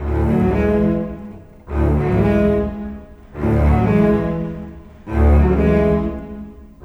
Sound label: Bass Cello, Cinematic